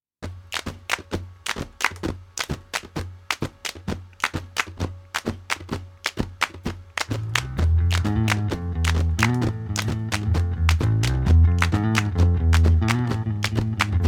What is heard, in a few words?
bass